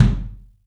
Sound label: ROOM KICK; 3D Drums